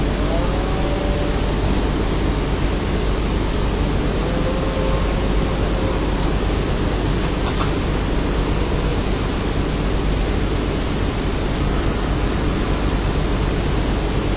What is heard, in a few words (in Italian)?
presunto lamento o canto non umano con spostamento fisico in zona